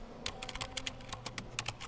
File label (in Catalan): Teclat